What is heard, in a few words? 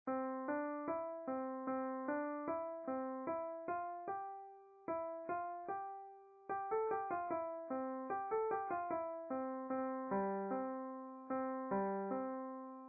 Eighth note Are you sleeping, beginner piano